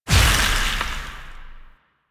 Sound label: Void Release Heavy; Weapons; Warframe; Duviri; Incarnon Adapters